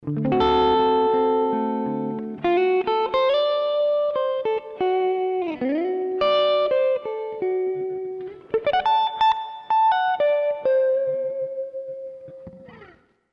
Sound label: Tweed, fender; Equipo fender